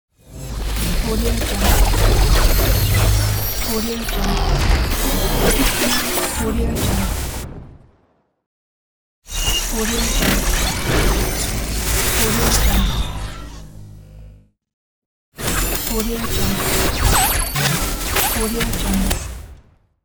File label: transform; b-n-p